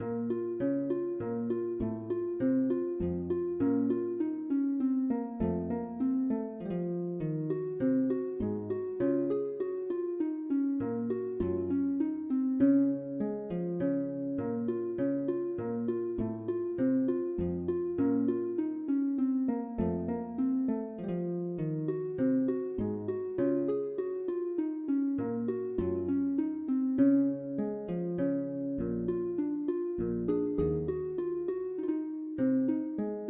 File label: Menuet